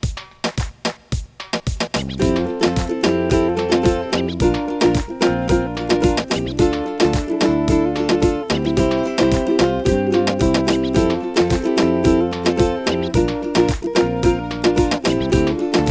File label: Jukebox Uke, no melody